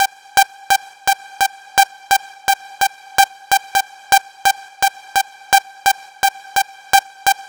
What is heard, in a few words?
Progressive Kit Melody; Mutekki Media; Vengeance Electroshock Vol.2